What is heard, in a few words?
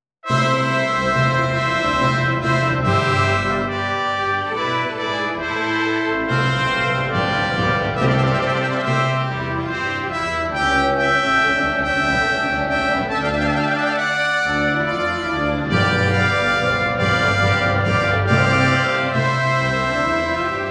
Instrumental; Mozart; Coronation Mass